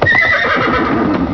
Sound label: neigh